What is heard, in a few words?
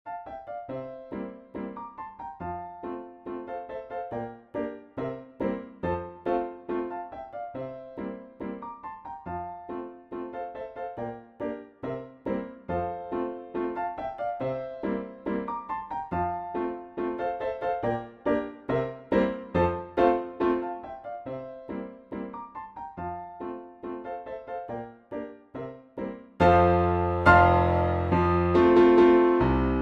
Waltz piano; Albert Victor